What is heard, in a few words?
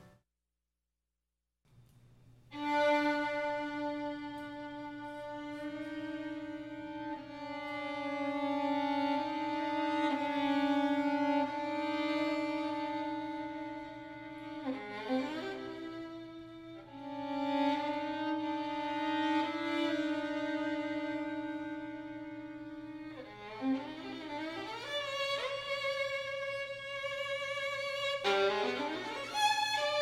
Sonata For Solo Violin Adagio Poco Piu Mosso Presto